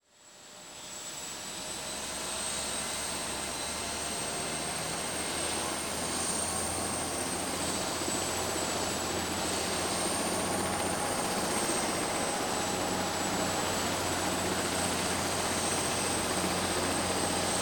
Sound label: Helicopter